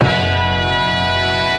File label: Stabs